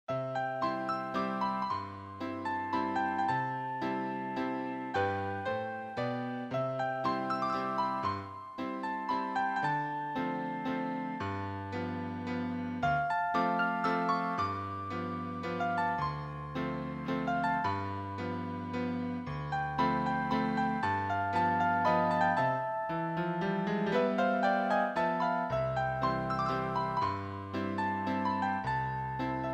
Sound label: waltz - sweet water